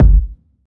short reverb steel kick drum sound F sharp key, bass drum sound